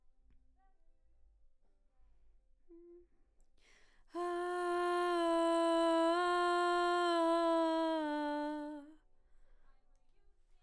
BG Vox

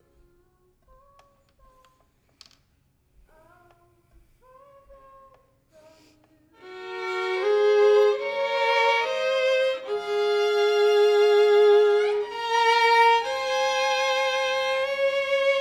violin

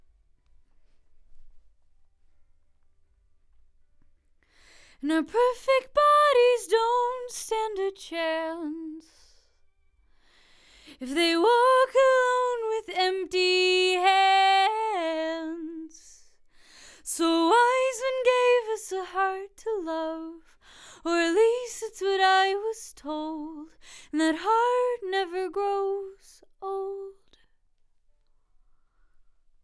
Vox

